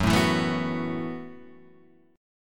B 7th Suspended 4th F sharp

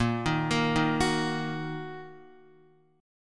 Bb Suspended 4th Sharp 5th, Bb, down, Guitar; Bbsus4#5 arpeggiated; JGuitar

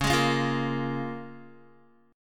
C sharp 6th C sharp down Guitar; C#6; strummed; tuning=4F,4A,5C,5G,5C,6E

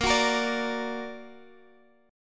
A sharp Augmented, Guitar, DADGAD

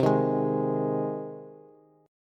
C Minor Sharp 5th C down Bass; strummed; JGuitar

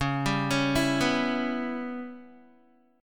Db Minor 7th Flat 5th Db down Guitar; arpeggiated

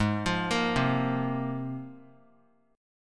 Ab 6th Add 9th Ab; arpeggiated; JGuitar

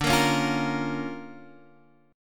D Minor Major 7th Sharp 5th, D

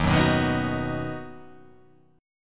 D sharp Minor Sharp 5th D sharp

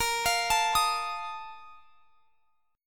A sharp Major 7th Suspended 4th; arpeggiated

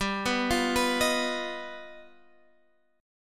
G 7th Flat 13th; G7b13 arpeggiated

